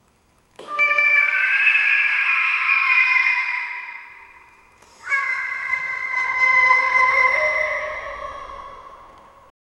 a creepy slow mechanical, doll

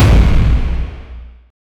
hard modern movie trailer; film

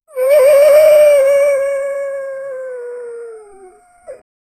the sound of a goblin, humanoid

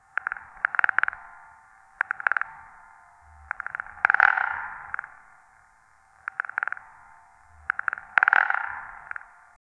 organic crystal contracti, organic

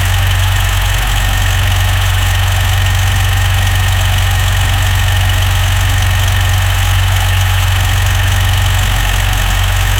v16 engine; v16 engine whit hybrid sy

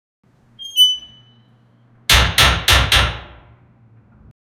the sound of a steel, lock

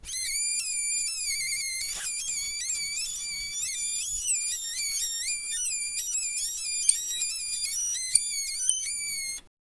teeth creaming and creaki, spider

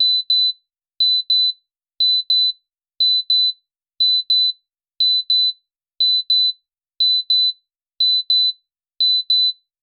racing start beeps; race start

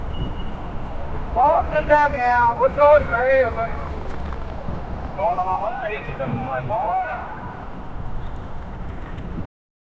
men walking near a parkin

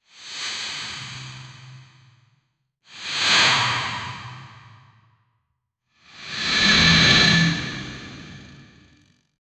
phoenix sound effects, mythical creatures

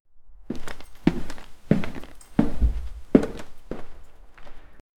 crunching

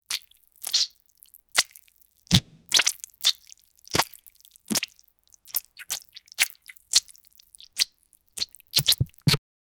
slime splatter squishy; organic

